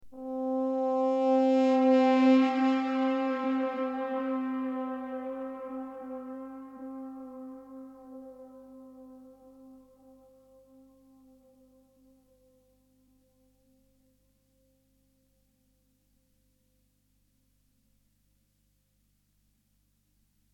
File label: Pad One Shot